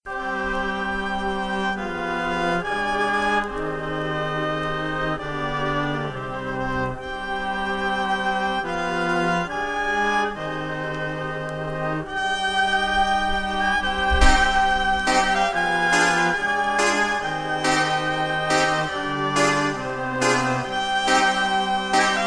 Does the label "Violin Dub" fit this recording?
Yes